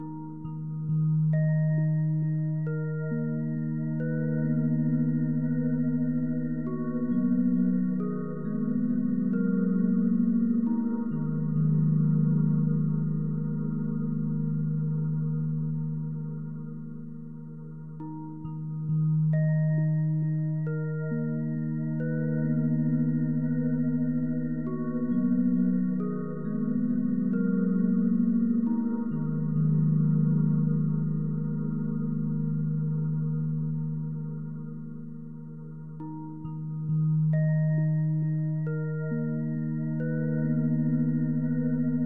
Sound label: BGM ocean slow